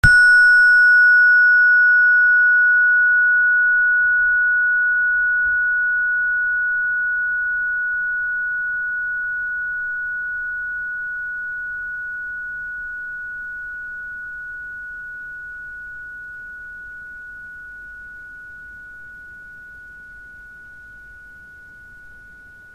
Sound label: space bar; jupiter